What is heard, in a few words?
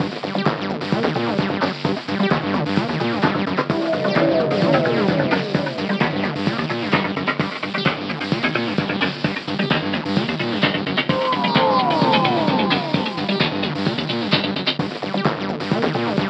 Boom Box Track loop lo